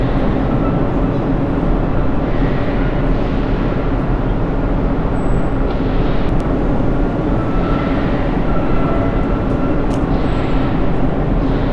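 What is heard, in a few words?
city ambience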